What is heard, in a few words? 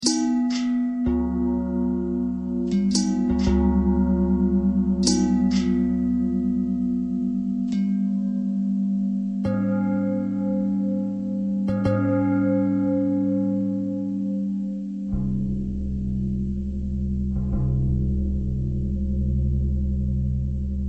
bell long bell thread pentatonic